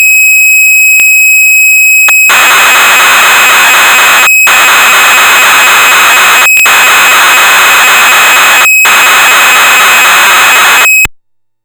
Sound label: Kawai Teisco SX210 Bank B